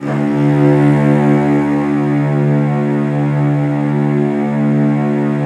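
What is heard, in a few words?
CELLOS E2, Strings; ILIO; Synclavier Strings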